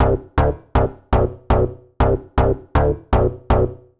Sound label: Digi Bass (120BPM), retro house